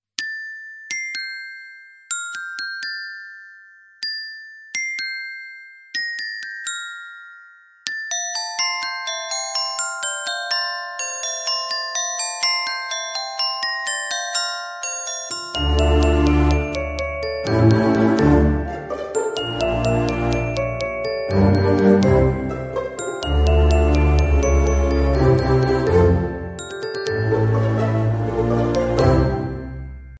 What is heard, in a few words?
Chimes Telugu Ringtone